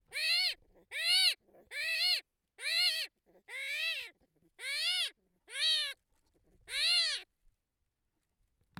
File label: C. vellerosus screaming, xenarthrans